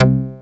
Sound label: bass